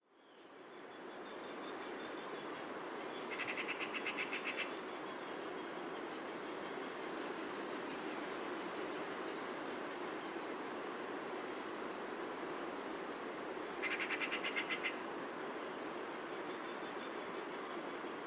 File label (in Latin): Pica pica; SETE